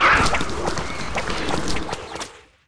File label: tail swipes, aliens drone; svencoop